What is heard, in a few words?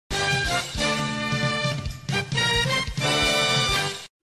round win